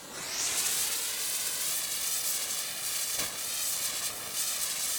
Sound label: Fuse burning